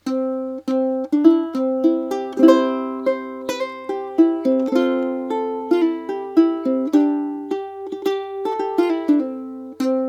scotbrave, ukulele

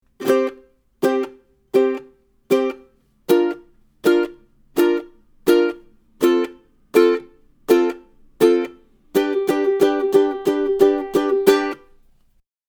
Strum, ukulele

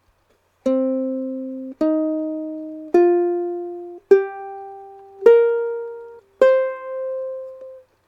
minor pent, ukulele

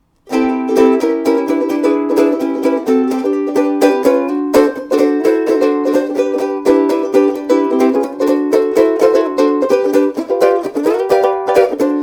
kala strum test, ukulele

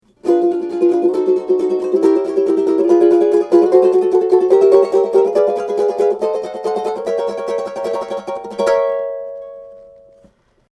train tracks, ukulele

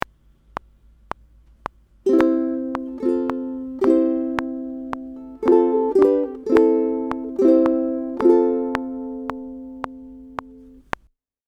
Strum, ukulele

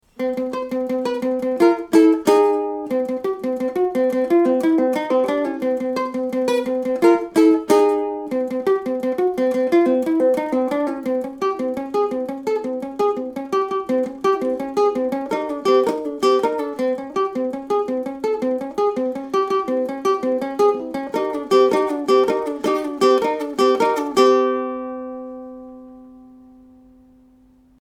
ukulele

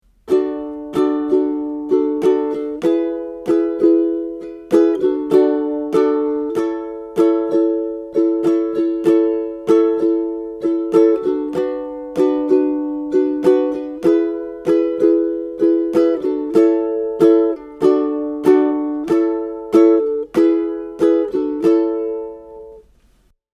Chorus Strum, ukulele

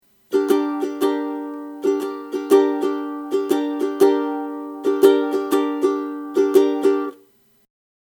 Strum Intro, ukulele

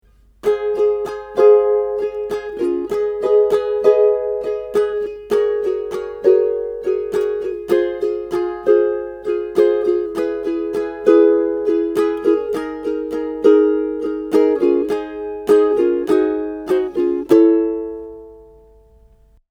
Verse Strum, ukulele

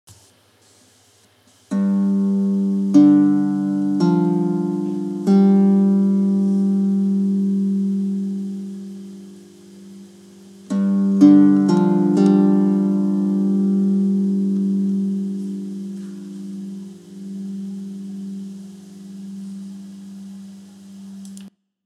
Sub bass sound G D F G

